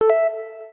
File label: Modular Rebuild Chime